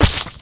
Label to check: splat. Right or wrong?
Right